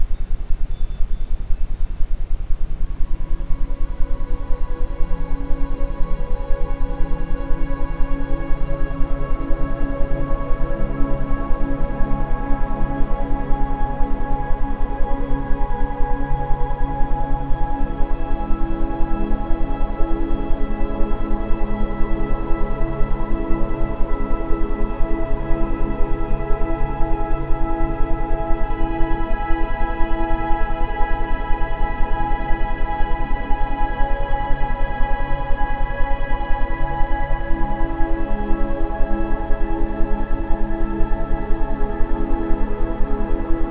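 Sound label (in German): Iso Energie Leistung Quickie mit Ambient Sound, Isochrone Töne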